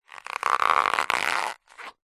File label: foley balloon small inflated rub handle creak; zapsplat